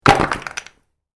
Wood drop Small, Wood: Pieces Drop Onto Concrete, Light; Materials; Stuart Duffield